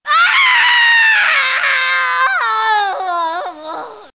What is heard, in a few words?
scream; mk4